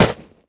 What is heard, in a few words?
step run, footsteps player debris